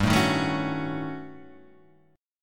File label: Eb 7th Sharp 9th Flat 5th, G